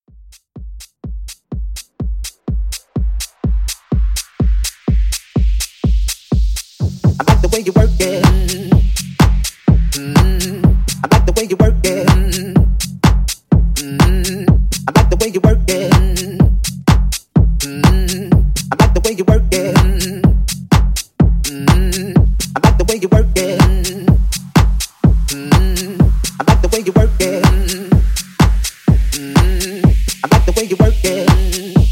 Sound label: Tech House Intro; Remixfreakz